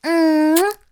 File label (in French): bisou